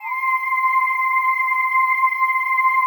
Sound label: PAD, ATMO PADS; Best Service; XX Large